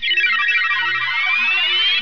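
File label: ice magic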